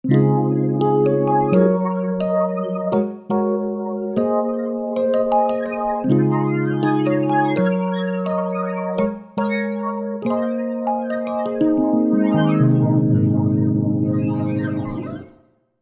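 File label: Trippin electric piano, V-Synth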